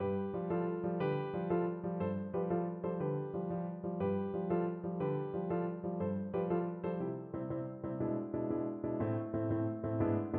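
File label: violoncello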